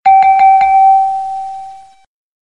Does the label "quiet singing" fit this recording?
No